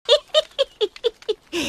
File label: Laughs; onepiecedle